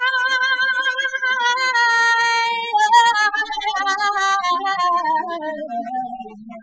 Vocals Castanets Applause Harm

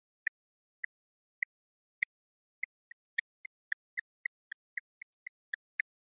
Castanets Violin Applause

